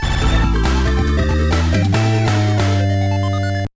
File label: Battle Start; bomberman max 2; gba